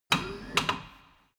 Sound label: tire changing machine turning platform latch short